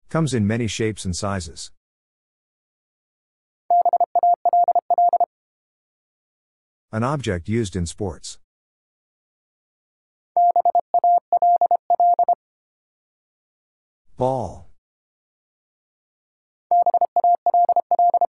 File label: Easy Words, Speed-Racing with Hints, 25wpm, morse code